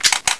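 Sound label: 12 gauge pickup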